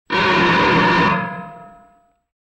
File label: metal, gnissel